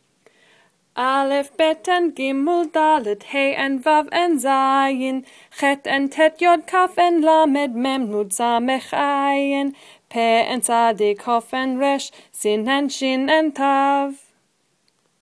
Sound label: Alphabet song with music